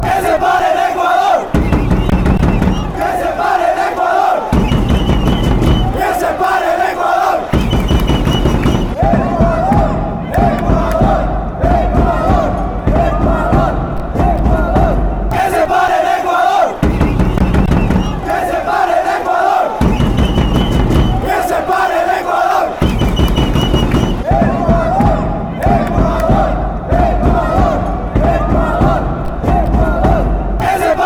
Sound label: world cup chants ecuador